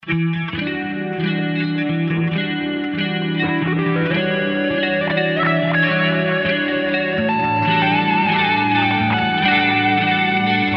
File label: chord